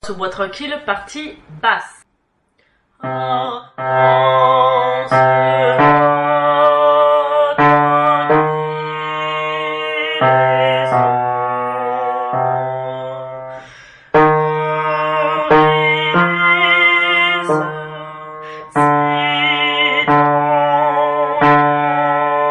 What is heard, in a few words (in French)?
Ah Dans Ce Bois Tranquille Basse; Gluck